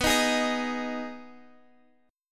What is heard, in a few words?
D sharp Suspended 4th Sharp 5th